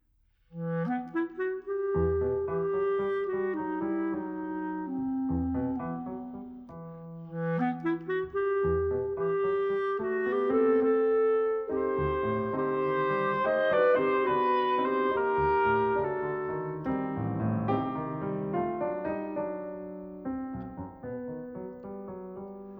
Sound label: for Oboe Clarinet and Piano